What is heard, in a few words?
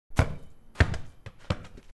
Kicking A Ball Against A Wall